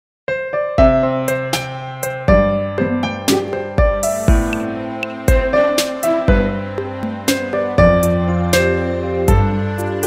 soft

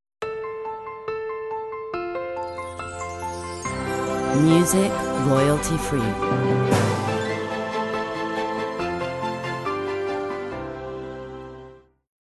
Cinematic

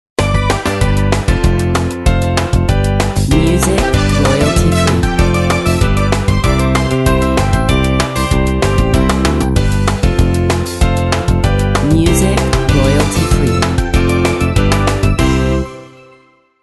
Upbeat pop

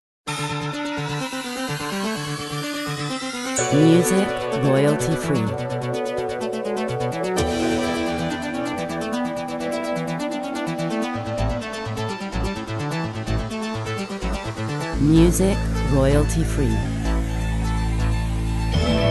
Radio And TV News

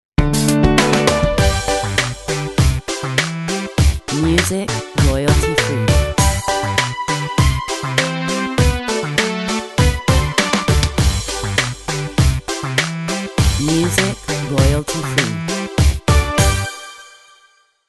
Upbeat pop

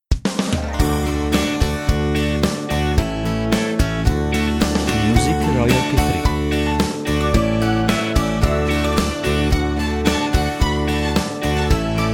Pop

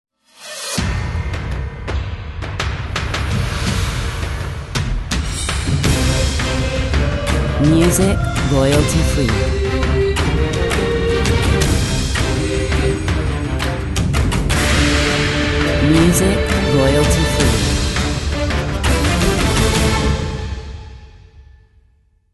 Wild trailer

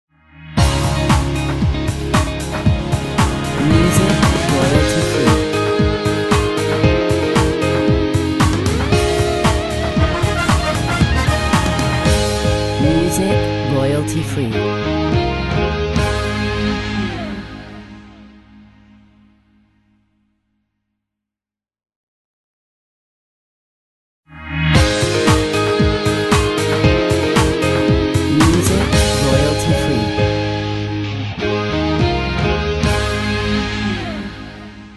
Radio logo

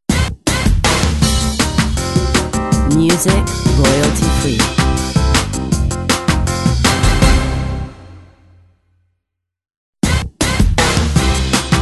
Radio logo

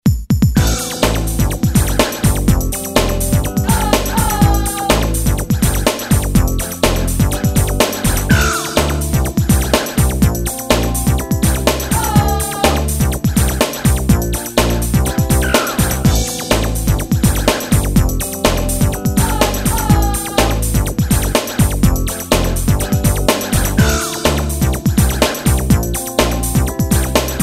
Advertising pop

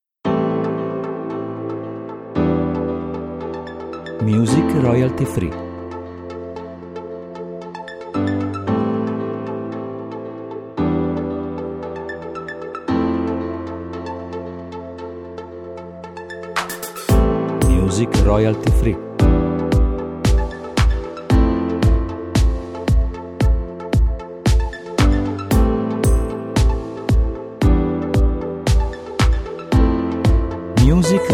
Corporate

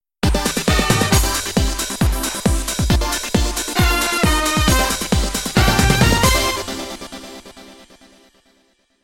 station break

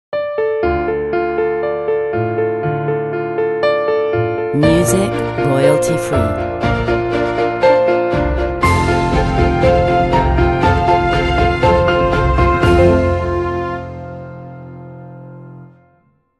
Emotional and Inspiring piano

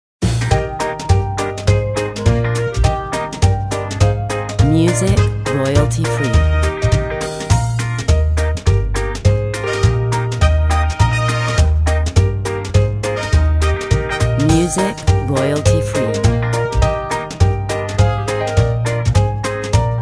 Childrens

